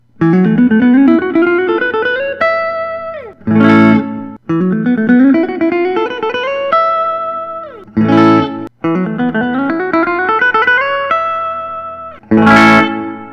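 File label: thorn artisan deluxe sfc caramel, guitars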